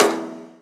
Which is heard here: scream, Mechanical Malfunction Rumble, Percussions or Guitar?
Percussions